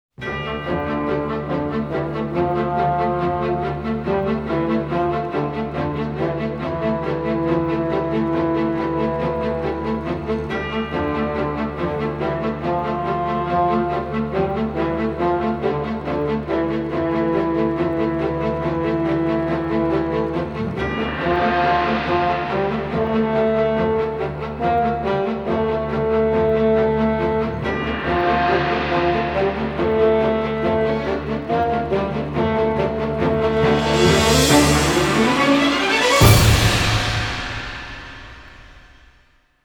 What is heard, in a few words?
Instrumental